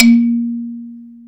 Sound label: XYLO A#2; C PERC; AKAI S-Series CD-ROM Sound Library VOL-8